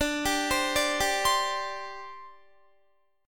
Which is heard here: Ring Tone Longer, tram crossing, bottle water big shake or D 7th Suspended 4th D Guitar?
D 7th Suspended 4th D Guitar